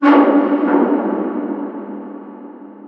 metal scrape verb; ambient; atmosphere

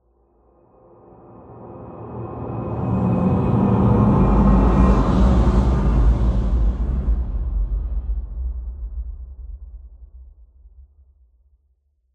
amb unique lobby; Half Life Alyx

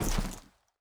body impact soft; physics

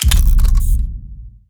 mainmenu press settings; Counter Strike Model Pack; CS:GO; panorama

